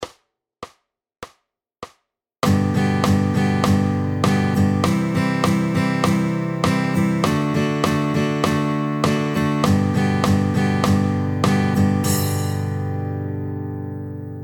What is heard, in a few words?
100 BPM, Leaving Out 3rd "&"; oefening 8; gebruikelijk slagritme in vele genres